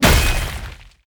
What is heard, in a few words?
beat heavy hammer